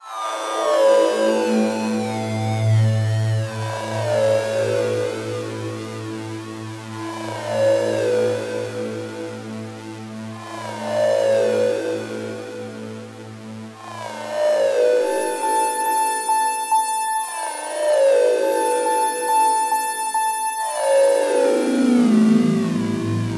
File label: SYNTH